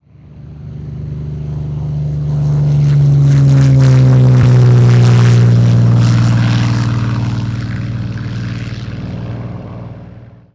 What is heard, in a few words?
plane